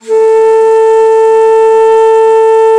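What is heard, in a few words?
bass bansuri, World Winds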